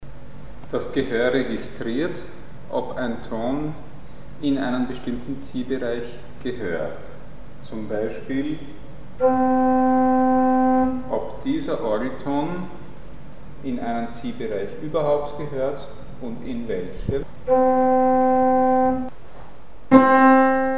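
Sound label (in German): Verschiedene Frequenzen im gleichen chromatischen Gehörs-Ziehbereich; Mikrobuch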